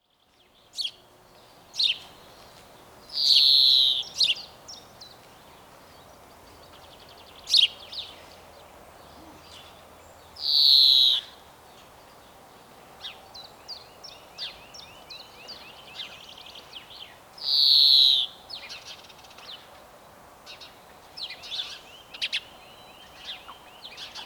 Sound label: Chloris chloris; SETE